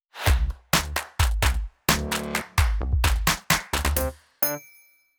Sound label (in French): logo sonore musique; FLEXTON